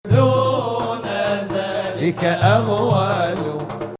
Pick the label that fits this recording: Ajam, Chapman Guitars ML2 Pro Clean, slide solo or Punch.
Ajam